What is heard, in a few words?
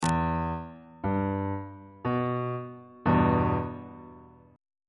e minor chord on bass clef